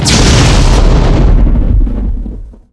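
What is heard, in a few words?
explosion, weapons; zaero; a2k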